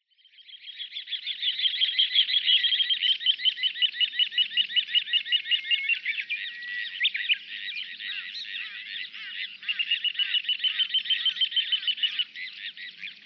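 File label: little ringed plover, watarase; koe; kochidori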